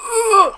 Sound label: Get Up; TR3; Playable Tutorial Level